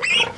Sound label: boar death; Risk of Rain